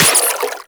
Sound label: Water Sprayer Beam Impact Flesh; Warframe; Weapons